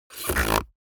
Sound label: human mouth beatbox tongue roll hard; smartsoundfx